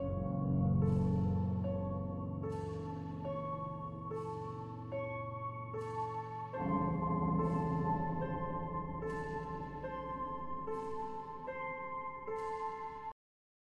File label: music; Be Cool Scooby-Doo! - The Mysterious Mansion